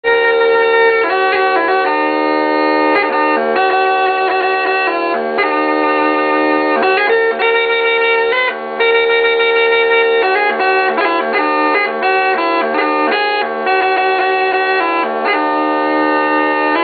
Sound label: bagpipes kysuce